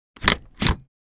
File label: Paper hole puncher single punch